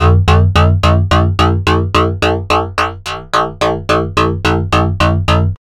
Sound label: BASS, "(F)"; neuro; Stanza